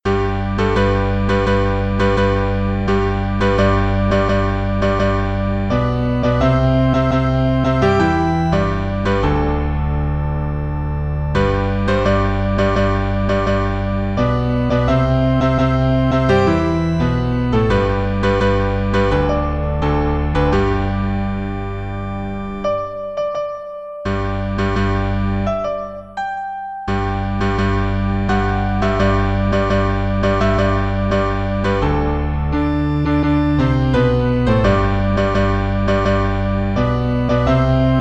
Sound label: P Bass; Wiedersehn